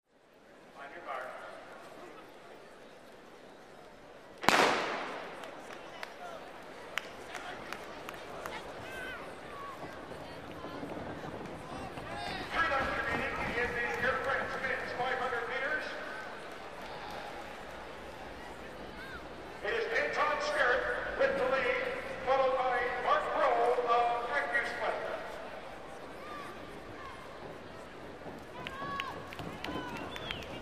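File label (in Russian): бег на 400 метров и овации болельщиков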